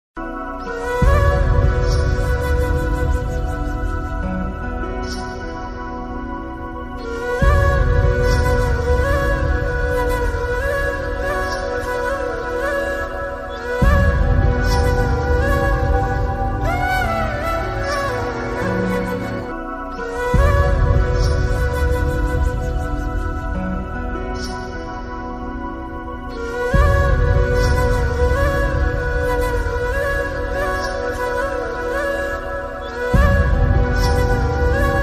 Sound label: sad version; khuda aur mohabbat season 3